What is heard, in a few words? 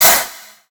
spread needle; tf2